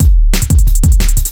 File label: 180 Panic Drums